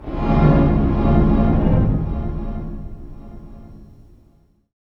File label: STR HIT, String FX; Roland - LA Composer vol. 2